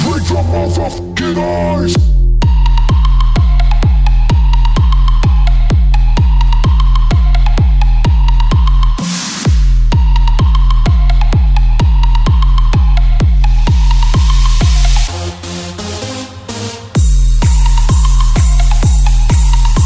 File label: EDM Tune